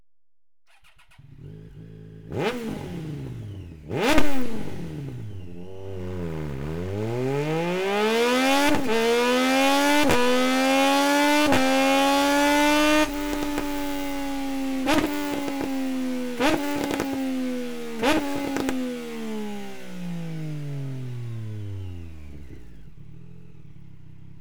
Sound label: Akra slip street triple